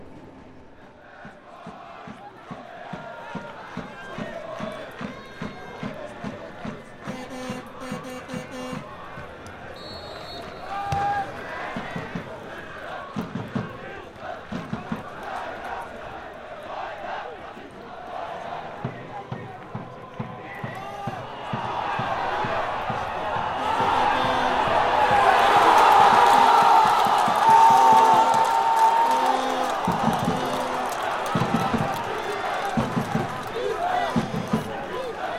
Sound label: Soccer Match In The Bozsik Stadium; Kispest-Ferencváros